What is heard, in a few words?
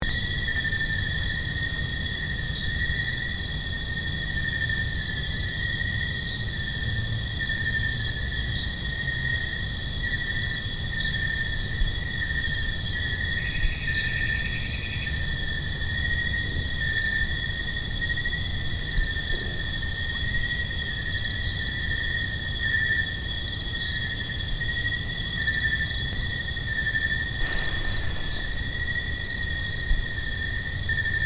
Night Chorus Lodge Kitanglad Stereo